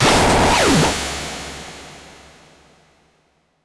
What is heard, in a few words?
wpn laser; PC Gamer IT CD 47; Centauri; alien-x-fire-demo